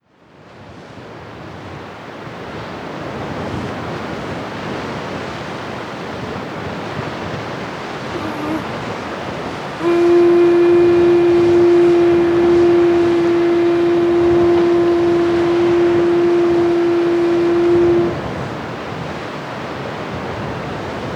Seashell Horn; Μπουρού

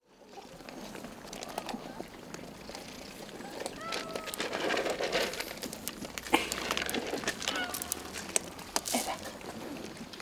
Feeding cats in Goudianika; Ταΐζοντας γάτες στα Γουδιάνικα

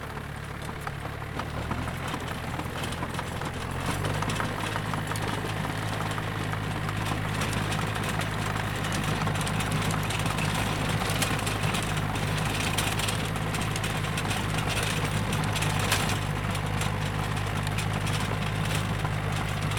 Farming Works - Αγροτικές Εργασίες

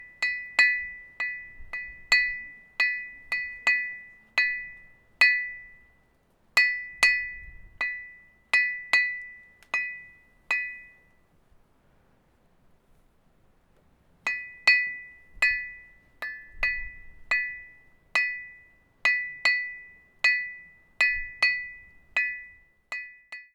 Stone; Myrtidiotissa; Πέτρα Μυρτιδιώτισσα

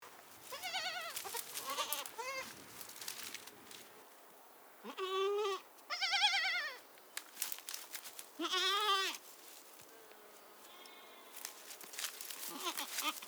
Ntourianika village - Το χωριό των Ντουριάνικων

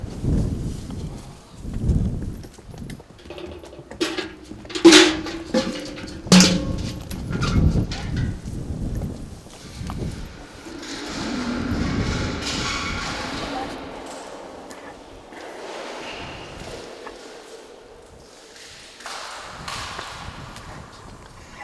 Well in Rizes - Πηγάδι στις Ρίζες

